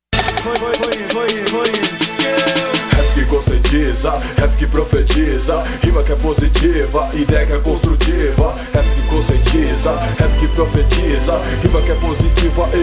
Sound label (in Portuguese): rap